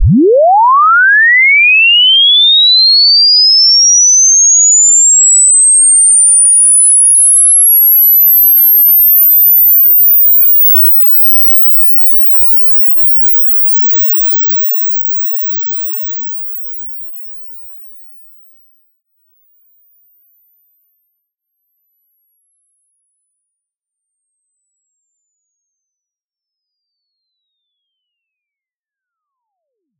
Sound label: sweep 1Hz 44000Hz; RDacoustic; hdsweep